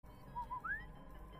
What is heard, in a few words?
Whistle; Sam Fisher